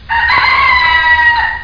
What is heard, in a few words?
rooster; Epic Interactive Encyclopedia